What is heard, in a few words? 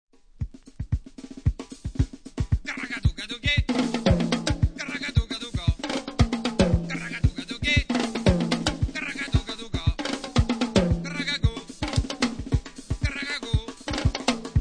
the drums speak